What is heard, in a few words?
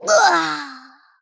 Death